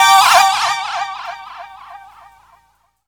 80S Stab; Zero-G; Soundclash Nu Dance Electro; Acidized Wavs; FX Atmospheres

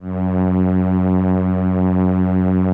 SOLINA, String Machines

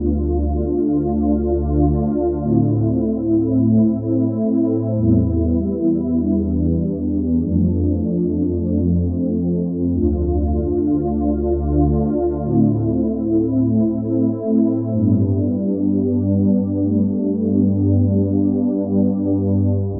Ocean Pad; Dr. Fink's Funk Factory

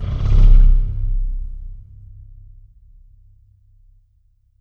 Pipe Rub; Effects

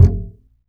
Upright Acoustic Bass; 1ST POSITION; Larry Seyer

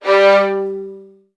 G1, VN 16TH, Virtuoso Strings; ILIO; Kirk Hunter

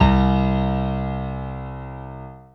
PIANO, Dance Mega Synth; Best Service

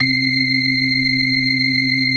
BLOOD OCT, Synth and Organ; InVision Interactive; Keith Emerson; The Most Dangerous Synth and Organ; P.BLOOD OCTV

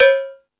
Thundadome, E-MU Pro-Cussion

